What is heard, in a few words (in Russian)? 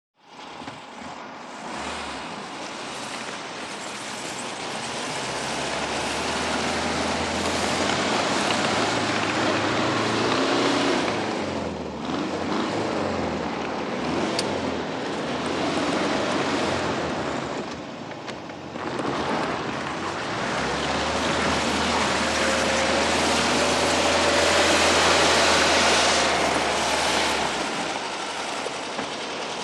Snegouborochnaya ratrak